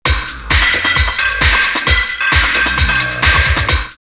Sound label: drumloops, Techno House, 132; Monday; X-Static Goldmine